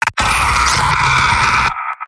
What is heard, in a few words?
soldier pain severe; mvm